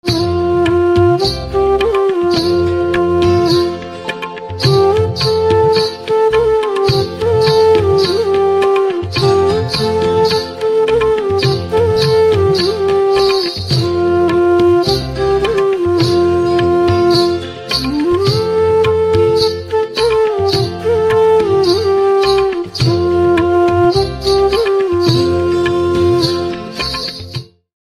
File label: Flute